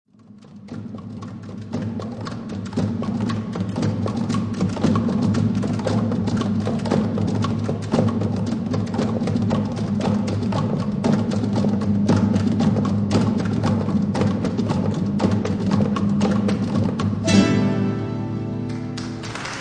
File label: conga